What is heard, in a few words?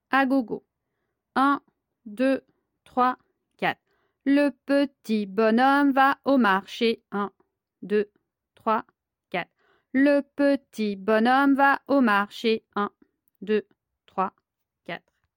agogo; Les rythmes de la batucada